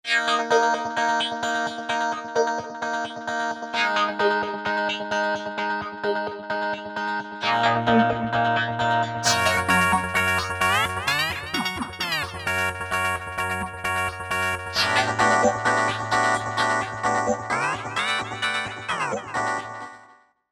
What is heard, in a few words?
Vox Pulse